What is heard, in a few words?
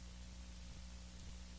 line noise, Vinyl